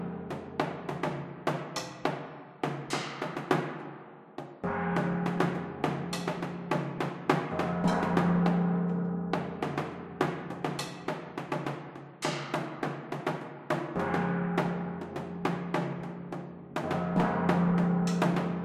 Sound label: Storm Perc; The Solus Project gamerip 2016